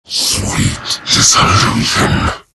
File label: death; terrorblade